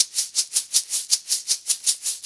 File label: Blues Perc Loop; Drum Tools; Sonic Foundry (Sony Creative Software); Loops & Samples Drum Tools